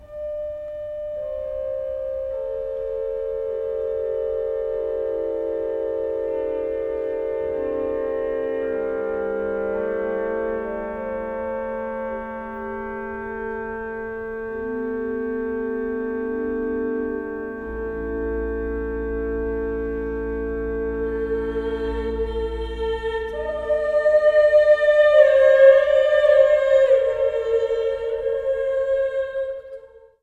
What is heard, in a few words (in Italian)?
voci